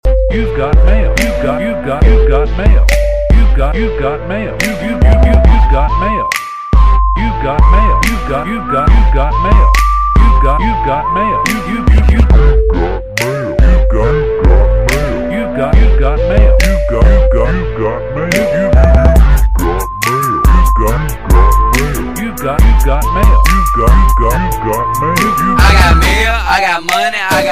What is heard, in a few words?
you got mail hip hop